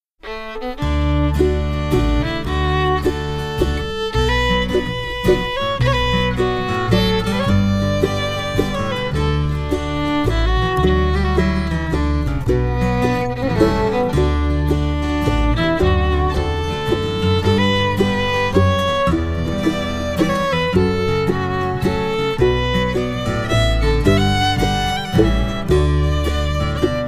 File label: Low Country Waltz, Resonator Guitarist